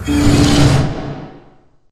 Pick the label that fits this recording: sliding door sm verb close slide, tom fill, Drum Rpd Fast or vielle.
sliding door sm verb close slide